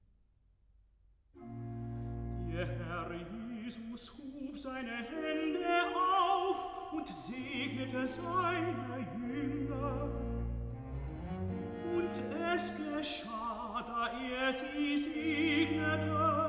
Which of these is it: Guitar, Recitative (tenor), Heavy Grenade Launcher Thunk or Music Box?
Recitative (tenor)